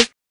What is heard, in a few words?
Snare Roll